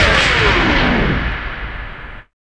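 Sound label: SCRATCH; E-Lab; X Static Goldmine 1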